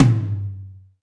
DM5 Tom M, Alesis DM5 drum machine

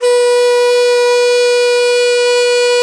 DIZI, Old World Instruments, Winds; E-MU Producer Series Vol. 7 – Old World Instruments

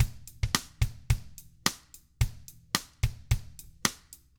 POP, Drums

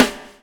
BUBBLE; Spectrasonics - Backbeat

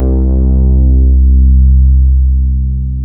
MODULAR C3, MODULARBS

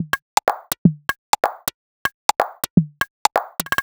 Top 125bpm, House Drumloops

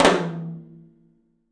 open tom; 3D Sound; 3D Drums; 10 TOM

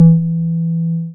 BAS MINI, Mini-Moog

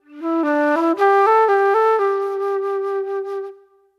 Soul Plate Flute

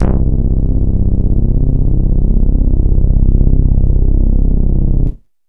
DANCE, synthesizer bass; VFX-SD

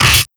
Criket Fx, Cricket

